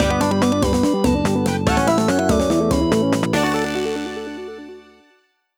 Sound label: Victory Jingle; Go Go Kokopolo 3D Space Recipe for Disaster